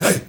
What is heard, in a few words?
HEY HEY